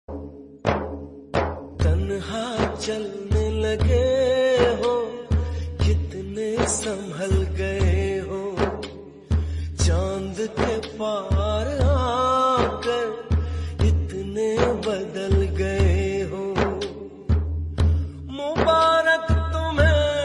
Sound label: Old Hindi Song Ringtone